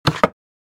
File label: Small wooden box container open